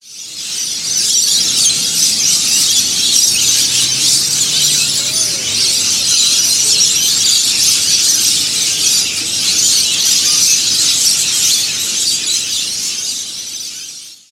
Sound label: lorikeets, cairns australia